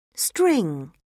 string; Oxford Word Skills Intermediate

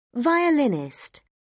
violinist; Oxford Word Skills Intermediate

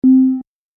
ping; Oxford Word Skills Intermediate

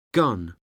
gun; Oxford Word Skills Intermediate

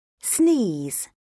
sneeze; Oxford Word Skills Intermediate

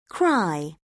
cry; Oxford Word Skills Intermediate

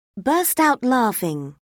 burst out laughing; Oxford Word Skills Intermediate

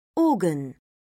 organ; Oxford Word Skills Intermediate

